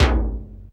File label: R8 BANG